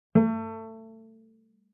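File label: Cello pizz fortissimo sul D A4; MIS Pitches - 2014; Strings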